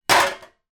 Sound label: household pan metal cake tin throw down on another pan